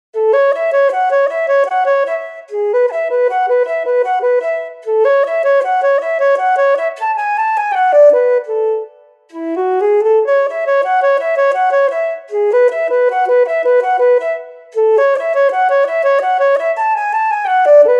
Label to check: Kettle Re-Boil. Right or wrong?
Wrong